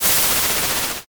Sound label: grill